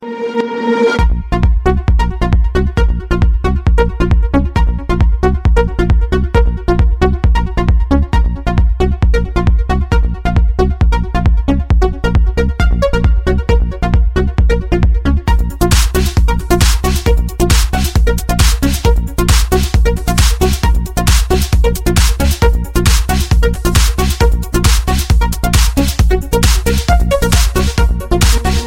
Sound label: bass mix